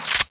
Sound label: clipin, weapons; thanatos1